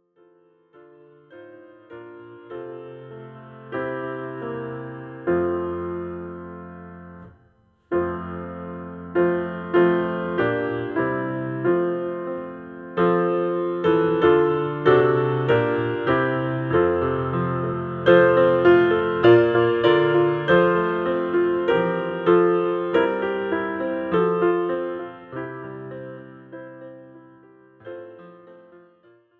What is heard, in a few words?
PIANO; gospel